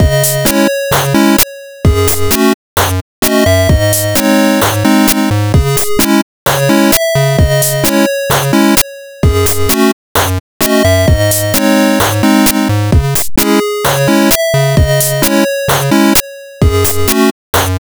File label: Menu Theme